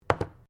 JAR PLASTIC PLACE BENCH; Various kitchen jars; Set Down Sound Effects; Set Downs (Sound Effects:Objects)

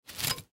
Surgical Tool Pick Up Acrylic Paper; Scrape; Paper Towel Surface Surgeon Surgery; Hospital; Emergency

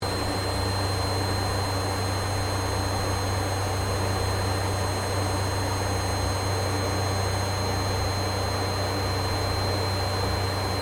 AIRCRAFT CA-27 SABRE FLYING INTERIOR; CA-27/F-86 Sabre; Airplanes; Vehicles

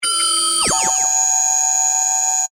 Scanner Beam Ray, Scifi; Science, Fiction; Tech; BlastWave

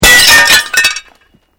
Glass pane smash; Material components for constructing more complex sounds; Materials

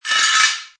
Metal Plate drag; Metallic; Multimedia; Lynne Music

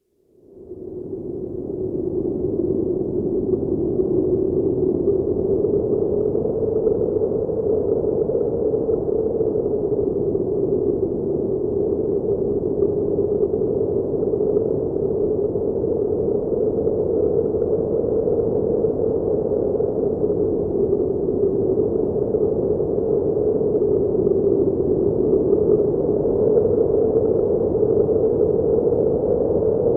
Polar wind; Weather; BBC

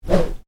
Swish small, Swish: Short, Light; Whooshes; Sound Design; Stuart Duffield

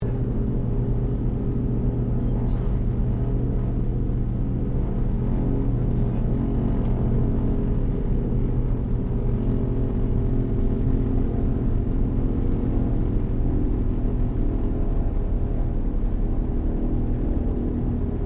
AIRCRAFT DOUGLAS DC3 TAXI INTERIOR; Airplanes; Vehicles; Qantas 1942 DC3

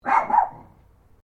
Animal Dog Chihuahua Bark Double; Newcastle; Various domestic dogs; Dog Sound Effects; Dogs (Sound Effects:Animals); Foundation1

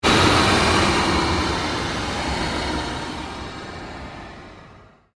Created Pulse Long Sweep, Sweeper Sound Effects; Sound effects created by manipulating other sounds

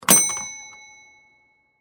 Bell Store Vintage; Machinery Sound Effects; Machines; Blast Wave

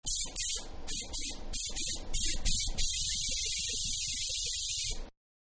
Pressurized Air, Dentist-Pressurized Air; Machinery (Sound Effects:Machines)

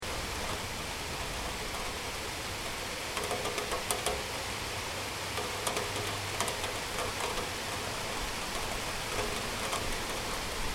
Heavy Raindrops On A Metal Window Sill; Weather; Crafted Signals

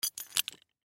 Belt Leather Set, Belt Leather Set Down; Objects; Foley; Blastwave

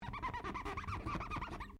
GLASS SQUEAK RUBBER SEQUENCE

